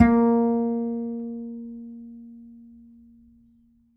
mf G, G string upright bass

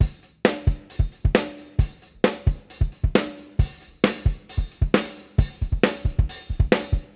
Beat Loops; Zero-G; Monster Beats

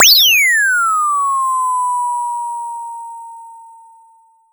SYNTH FX

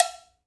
Cowbells; Roland L-CD701; PRC Latin 1

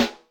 RIM short, Electric Snare

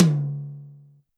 Tom Hard, YAMAHA RM1X

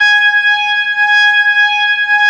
G#5S, B3, Vintage Keyboards

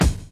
DRUM CHOP; AMG Gota Yashiki Groove Activator

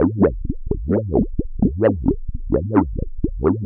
Noise, LFO & Noise

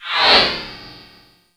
GLYDER, SF EFFECTS; Q Up Arts; Dream Experience

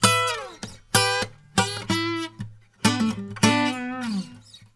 Blues Guitar Samples

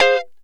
Lounge Guitar Chick 9ths A#3; Lounge Guitar Chicks

